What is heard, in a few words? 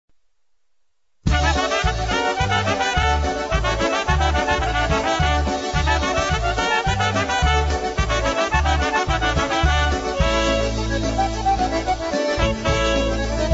jazz paulka